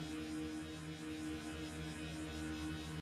Shield Loop; doodle jump 2; android

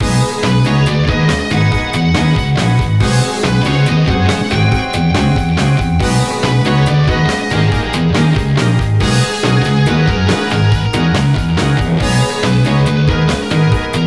Boss Theme; Excelsior OST 2021; Brass Knuckle

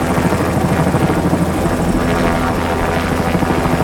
Amb Generic; Dirt 4

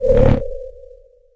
hologram on-off; edge extended; android gamerip 2012

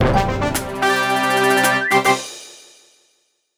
Jingle Legendary Monster Caught; StreetPass Mii Plaza; Ultimate Angler

